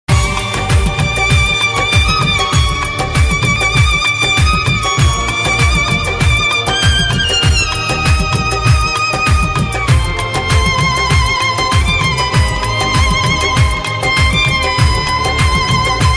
Sad violin

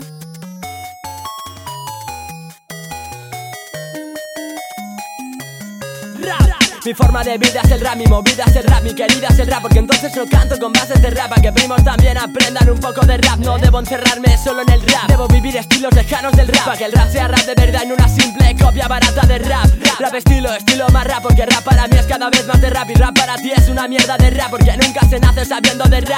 Rap; Tetris

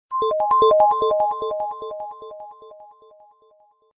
Ripple

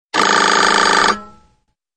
Dring Ring

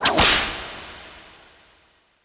Notification

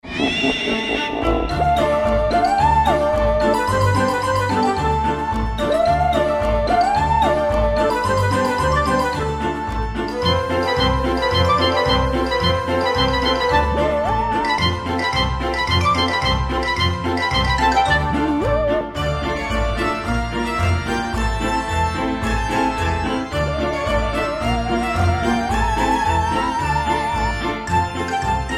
Halloween; Angry Bird

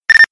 Walkie Talkie

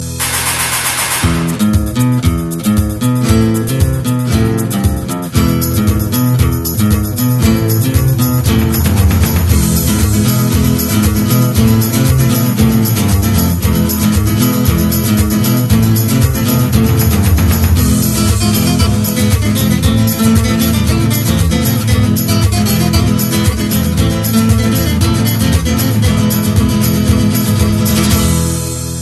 Spanish guitar

